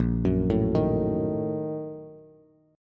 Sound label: Bb Major 7th Suspended 4th Sharp 5th, Bass; 6,9,7,8; arpeggiated